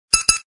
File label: missed call